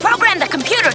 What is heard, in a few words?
VOX